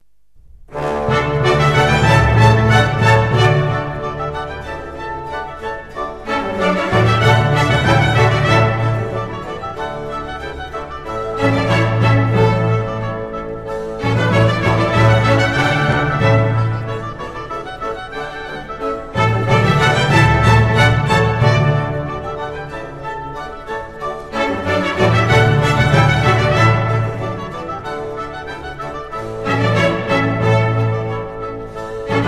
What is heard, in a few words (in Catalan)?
Concert de trompetes; Delalande